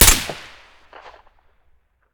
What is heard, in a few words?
svd silencer mono, weapons